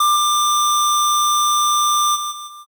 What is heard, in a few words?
ecg flat